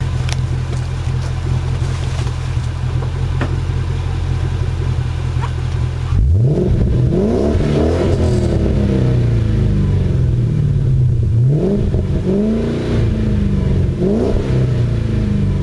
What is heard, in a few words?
new zero revving, MGZT